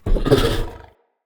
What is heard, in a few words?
Corrupted Battle Dog Stun Start, Animals, Vocals